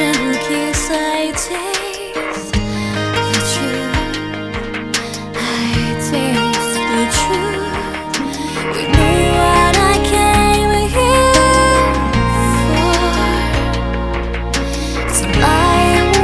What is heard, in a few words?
pop, music genre